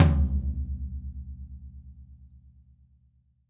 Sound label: DW Green Maple Tom Room; Sonic Foundry (Sony Creative Software); Vital Drums